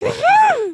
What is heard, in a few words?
excited, emotes